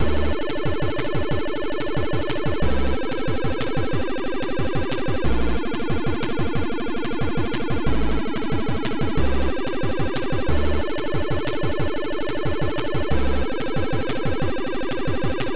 DRUM BAS

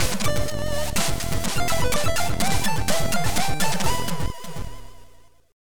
game over; Guns Gears

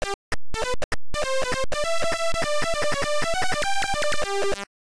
Title Beeper; Ping Pong

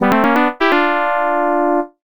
1up; Dokidoki

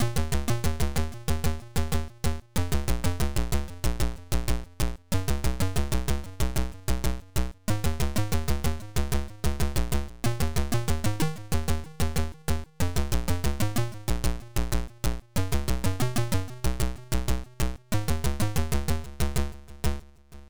bass theme

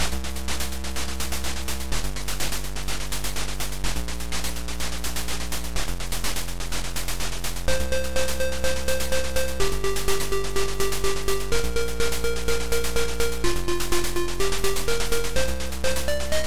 game music

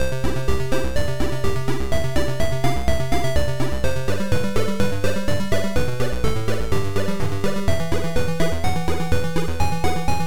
Game music; Wopgamma

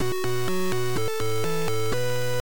Intro, Beeper

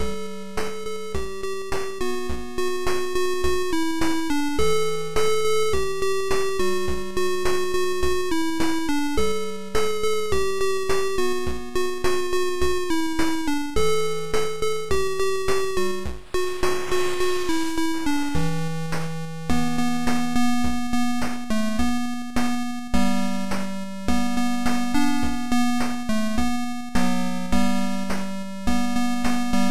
BEAT

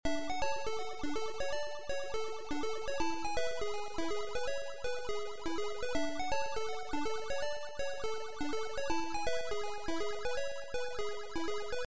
In Shop; Rustles Land 2; the land of rustles 2; arcade; classic platformers

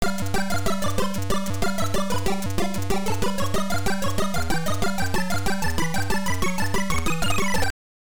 Title AY Beeper; Chase HQ

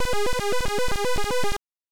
Title Beeper; Steve Turner; Quazatron

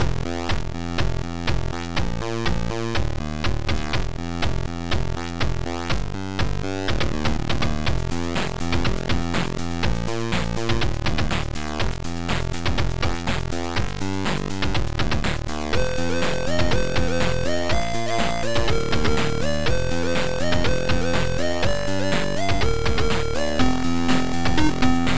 Drums

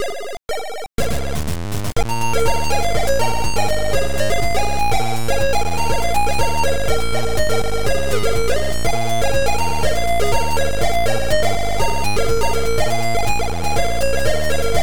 SINUSOID

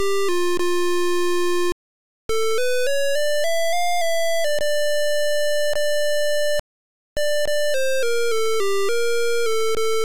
MELODY

